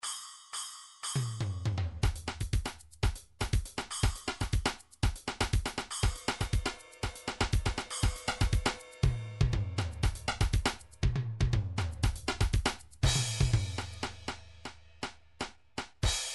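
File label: midi drums drums bossanova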